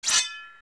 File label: Entrance Sword